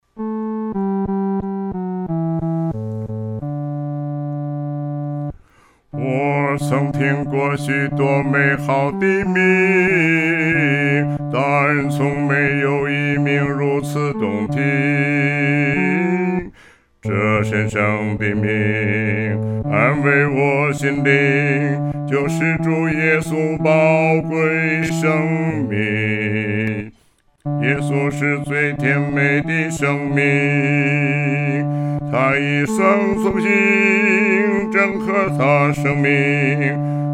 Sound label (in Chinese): 独唱 第四声